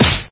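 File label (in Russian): просто удар без лишних спецэффектов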